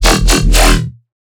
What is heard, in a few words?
Riddim Bass Hit E Ugly; One Shots; Ghosthack; Riddim Essentials 2020